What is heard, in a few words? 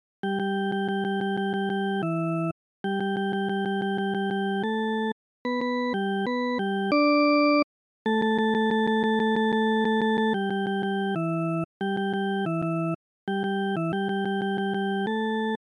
solo